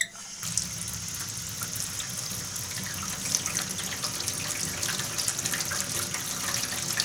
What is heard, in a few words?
FAUCET, KITCHEN; AKAI S-Series CD-ROM Sound Library VOL-6